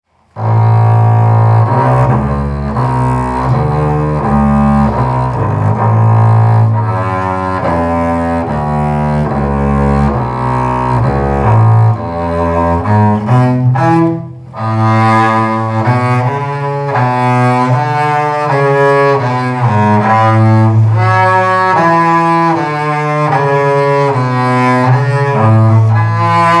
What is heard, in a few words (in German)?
Hymne KONTRABASS; LK Eisenach